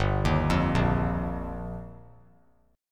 G Minor 7th Sharp 5th; Gm7#5; arpeggiated; JGuitar

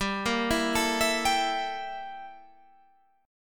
G Minor 6th Add 9th G down

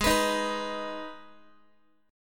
G sharp Minor G sharp down Guitar, chord; G#m strummed; JGuitar

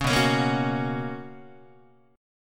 B Major 7th Suspended 2nd B Guitar; strummed; 4,7,4,8,5,5; 4A,4E,5A,5D,5G#,6C#; JGuitar

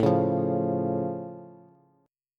A Augmented A, Bass

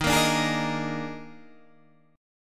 Eb Major 7th Suspended 4th Eb, Guitar, Standard; strummed; 11,13,12,13,11,11; EbM7sus4

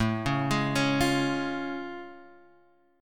A Augmented Major 7th A, Guitar Half Step Down

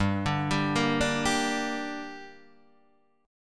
G Minor G down Guitar Drop D, chord

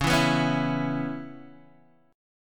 Db Minor 7th Db Guitar Open D

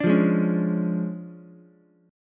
D Minor Major 7th Flat 5th Ukulele, chord; DmM7b5; strummed; JGuitar

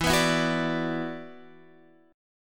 E 7th Suspended 2nd E down Guitar Half Step Down

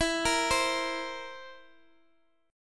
A sharp Suspended 2nd Flat 5th

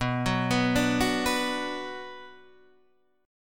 B-Minor, Guitar, Standard; arpeggiated; JGuitar

